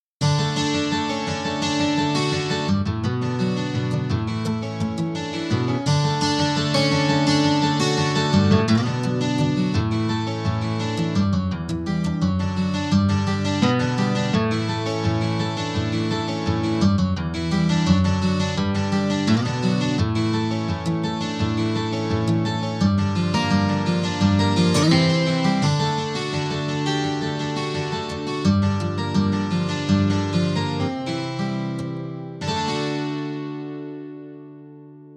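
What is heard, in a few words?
double track 12 string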